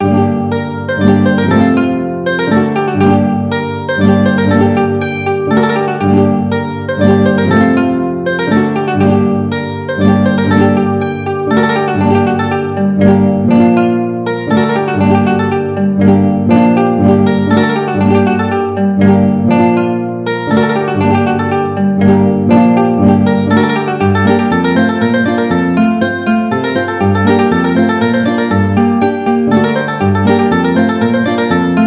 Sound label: mandolin guitar, mandolin-guitar duet